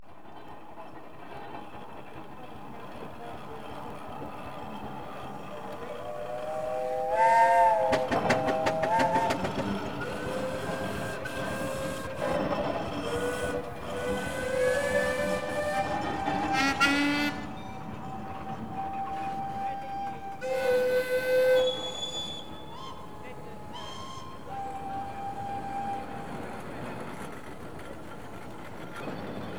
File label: Traction Engines Blowing Horns; BBC Sound Effects Library; Age Of Steam